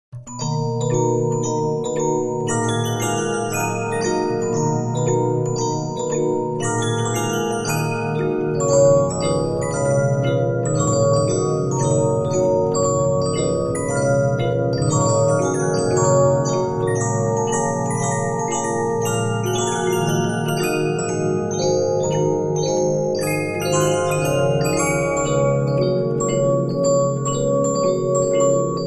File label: Regina, 15inch disc musicbox